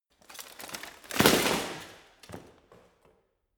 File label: bodydrop